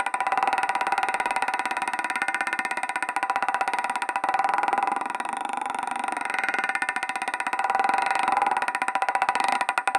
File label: a smooth looping rolling, toy